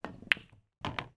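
sport pool snooker cue ball strike and pot other ball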